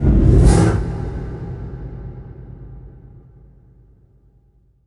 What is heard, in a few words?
impact flame, Cinematic Impact